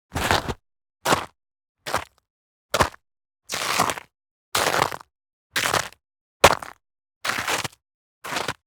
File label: Ice Footsteps Hard Packed, Snow Steps